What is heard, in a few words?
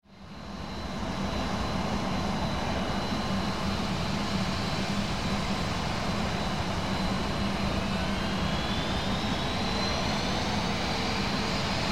crj700 startup